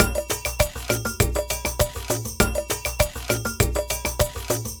Ensemble, Latin Percussion

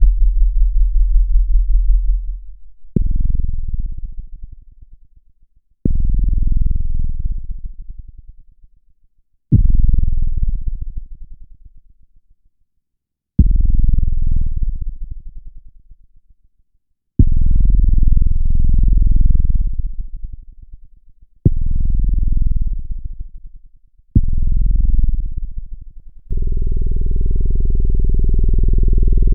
808 Subaudio

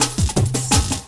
LOOP SD, Drum & Bass, 165 BPM; Ueberschall; Drum & Bass Resonance

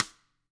TAMA SNRD; ILIO; Double Platinum Drums 1

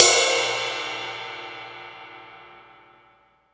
RIDE, Drum Dry; East Collexion; Drum 1 Dry

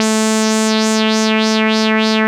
WAH WAH A3; CMP - Analogon

